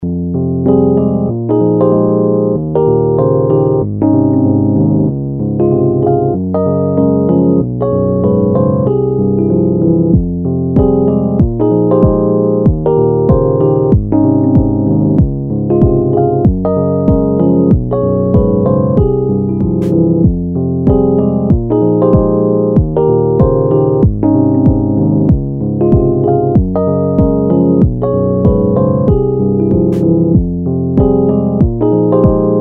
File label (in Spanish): Corporativo, Musica